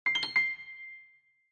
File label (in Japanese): 何かを発見した時の音